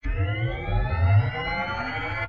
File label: police car weapon power int; Original TK Sounds